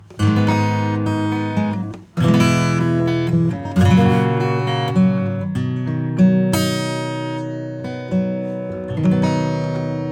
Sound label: acoustic; Summit Audio EQF-100